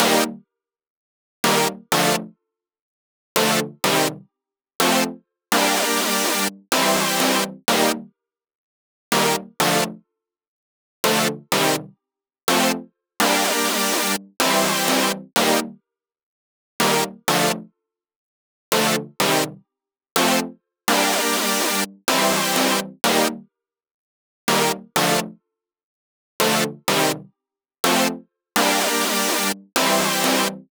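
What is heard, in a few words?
Break Synth, 125, D minor, Dry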